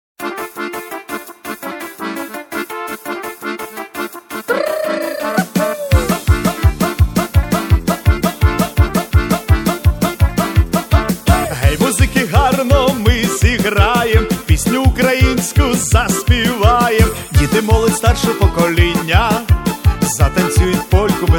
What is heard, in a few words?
polka